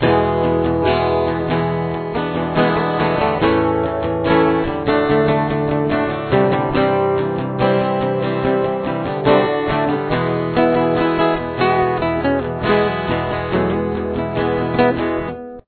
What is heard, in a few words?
chorus, guitar